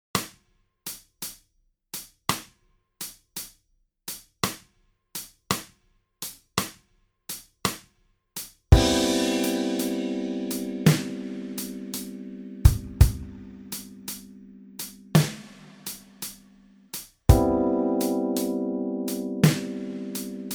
12 8 blues

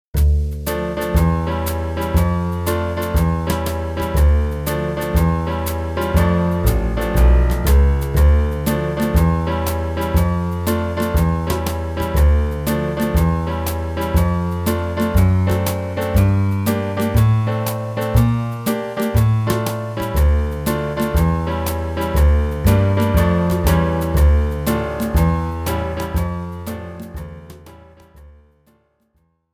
Canon Playback

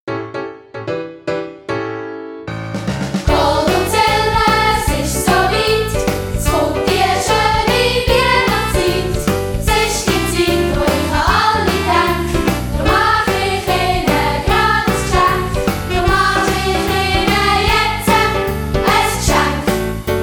Rock'n'Roll

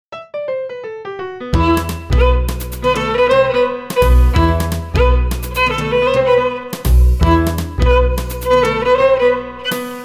Big Band Tune

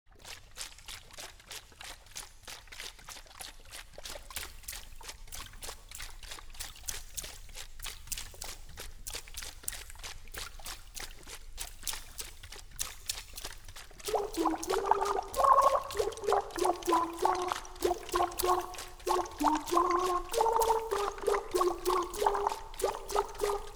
Cha Cha Cha

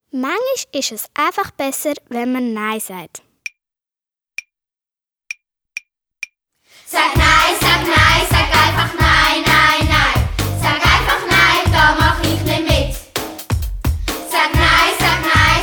rap

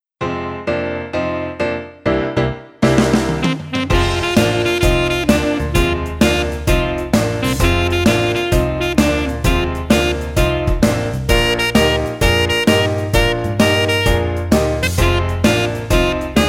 blues playback

